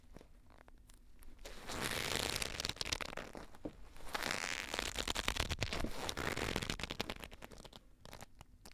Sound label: Vine Stretch